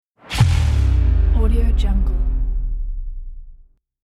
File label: bass drop whoosh